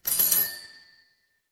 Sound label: office alarm clock